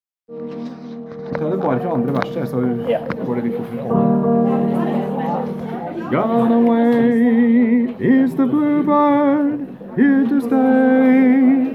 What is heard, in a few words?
Sleigh bells ring, Tenor